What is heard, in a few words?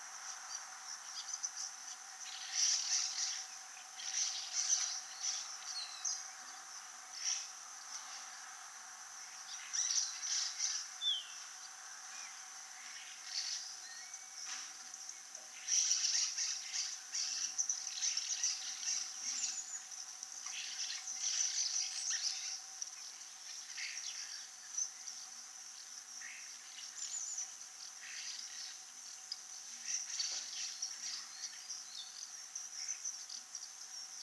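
spotless starling sturnus unicolor, birdsong; peniya ptits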